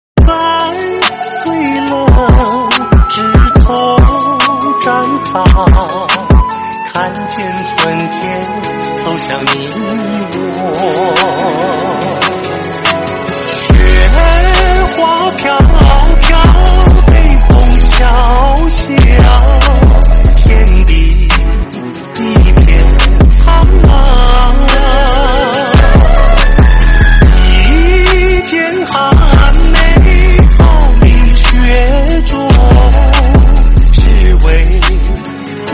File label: trap remix